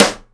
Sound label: clear steel snare drum sound a sharp key